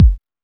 Techno B, Drum Machines, EMU XL-7

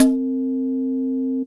Snare, Roland JX-305

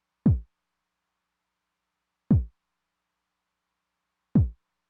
kick drum w accent, Roland TR-909; Roland TR-909 Ableton Project

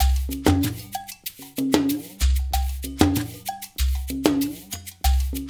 LOOP, Percussion, FUNK; InVision Interactive; Percussion Slam

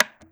switch off, SWITCHES; AKAI Anime Sound FX

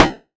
Strike; Bitkits

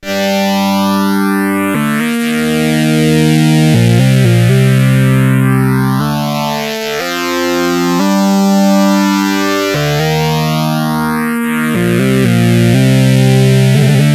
C64 Synth Phrase

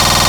Dubstep Bass

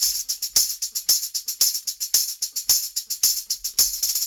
Raw Funk Tambourine, 70s Analog Funk; Sonic Foundry (Sony Creative Software); What it Is! 70s Analog Funk

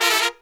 Pop & Funk Brass

